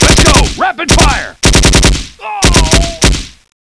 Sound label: warning alt; ambience